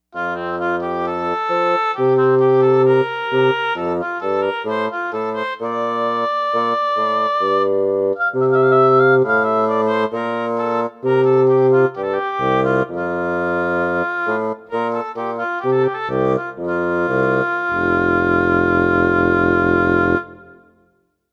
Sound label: basoon oboe; Jupiter-80